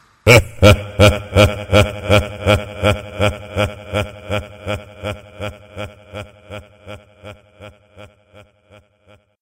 risada

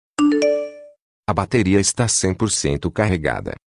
Bateria carregada

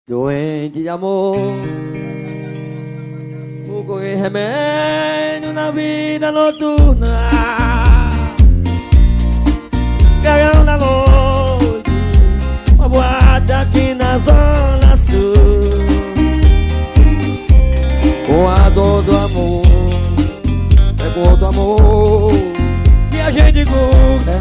Brega

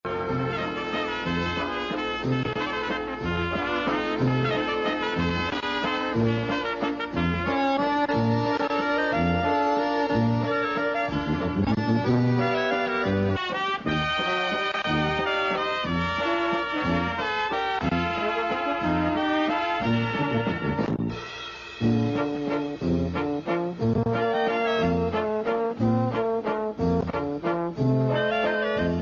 Valsa

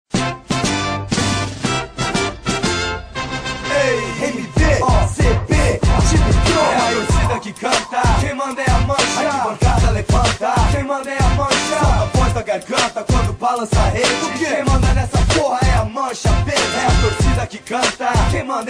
Rap; Palmeiras

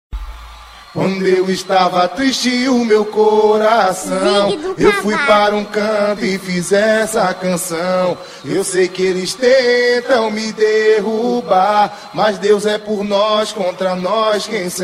Pagode

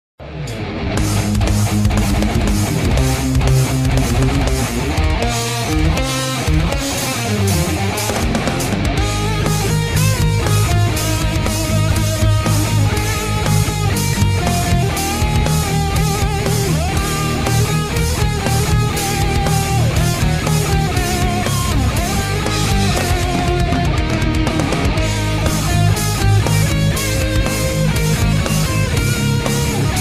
guitarra; Hino spfc

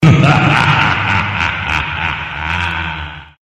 risada

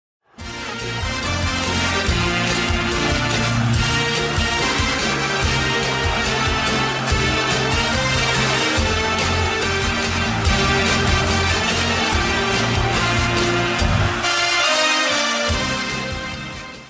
toque celular; SPFC

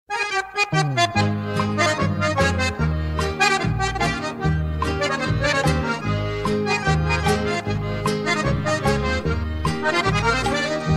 Xote

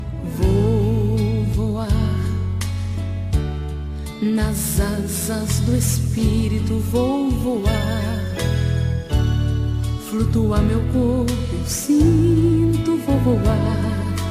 Gospel Antiga

